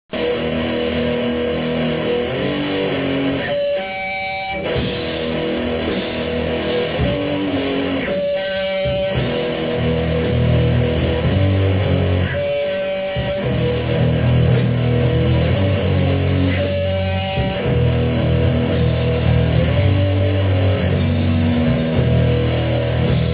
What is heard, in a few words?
guitar